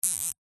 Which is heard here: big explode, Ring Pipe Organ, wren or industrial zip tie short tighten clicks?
industrial zip tie short tighten clicks